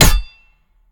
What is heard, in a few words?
swing hit; smite; lancelot